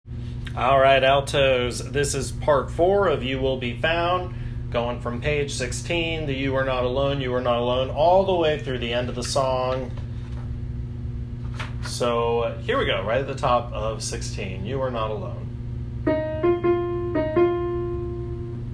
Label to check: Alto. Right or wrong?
Right